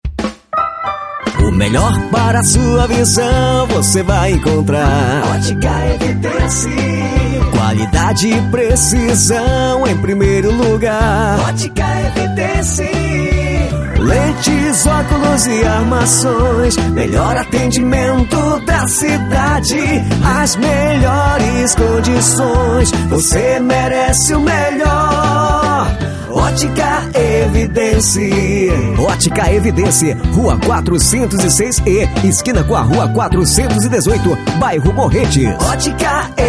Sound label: jingle otica